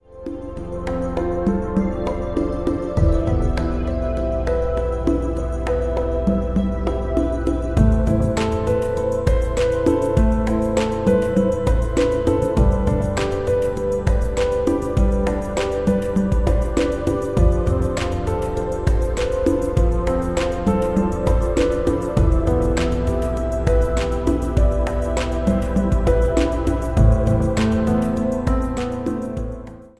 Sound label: Popular electronic sleeping atmosphere, ringtone; MIUI12